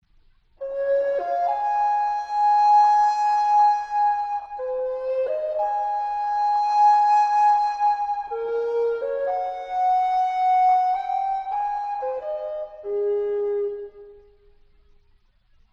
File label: Voice Flute